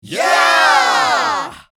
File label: Crowd reaction yeah